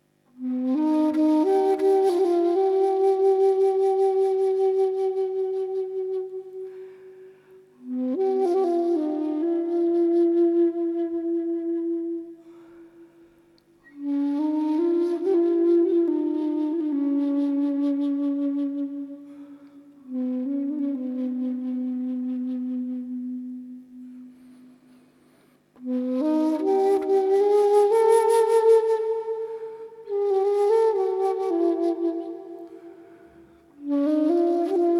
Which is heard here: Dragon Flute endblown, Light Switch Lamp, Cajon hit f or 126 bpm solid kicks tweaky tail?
Dragon Flute endblown